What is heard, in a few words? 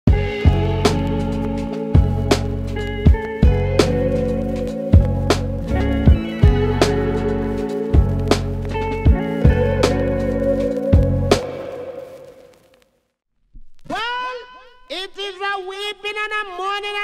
Roots Reggae and Classic Dancehall